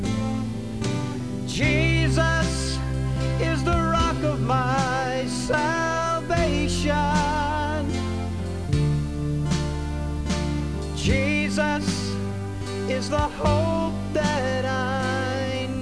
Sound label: Rock